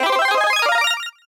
Power Up; SM3DL

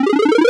Item Pop

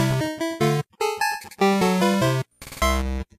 Wario goal music; SMM

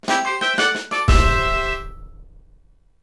Star Catch Fanfare; SMG2

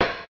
Note Boat Cymbal

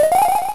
Jump Spin; SMA2

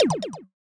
Item Stock Release

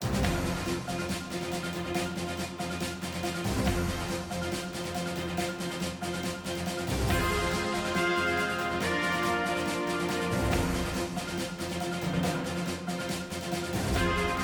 Dog-Eared Fight

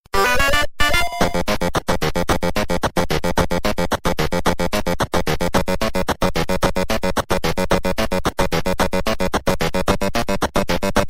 Hurry Up!

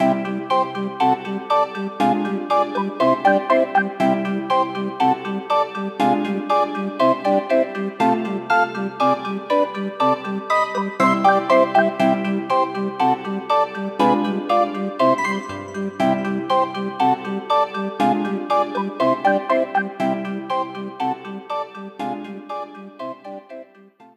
World Mushroom; NSMB2

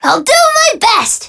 Alarm Clock (shut off); Penny

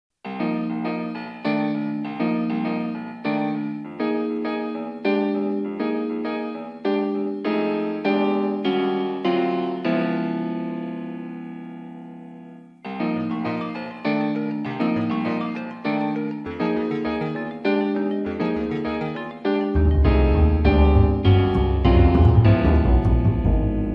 Underground; SMM; SMB

